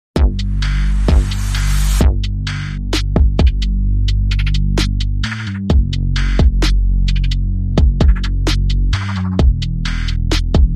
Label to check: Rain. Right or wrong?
Wrong